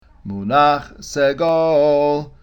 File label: munach segol, cantilation